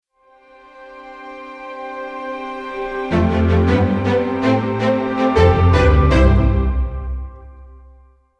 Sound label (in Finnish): BIRD MUSIC ÄÄNILOGO